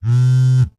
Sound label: vibrate